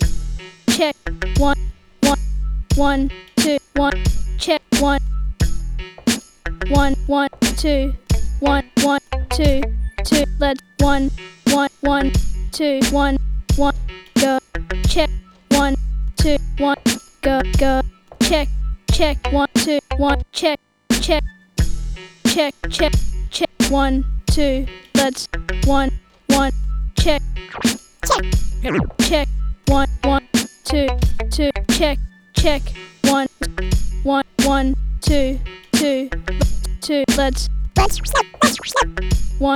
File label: scratches; fun palace 2018